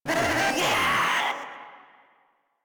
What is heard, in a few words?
Proto Infested player spotted; Warframe; InfestedNinetyNine